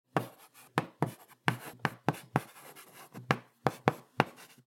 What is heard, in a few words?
Chalk stick writing on blackboard